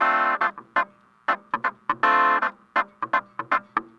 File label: Clavinet, 70s Analog Funk